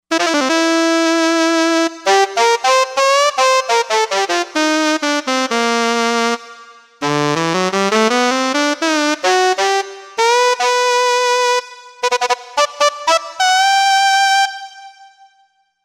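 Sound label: Reed Alto Sax, synth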